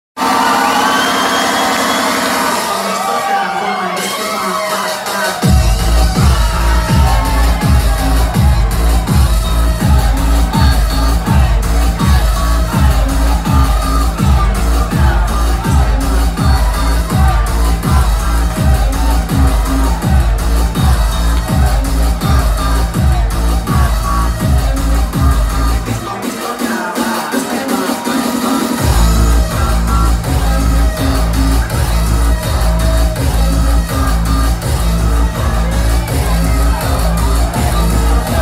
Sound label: Disco